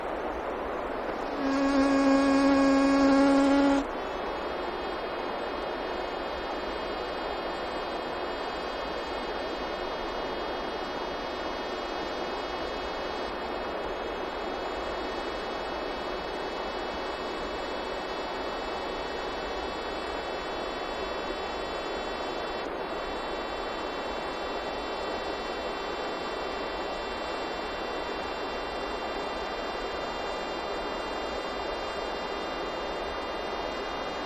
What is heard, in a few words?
Insect Wingbeats With Two Distinct Behaviors; alaska acoustic highlights; INSECTS